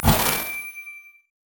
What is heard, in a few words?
sow the wind cast marker; LoL; janna